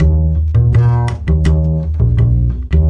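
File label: 165 acoustic bass jazz; MELODIC LOOPS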